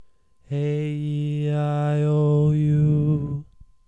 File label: aeiou Mid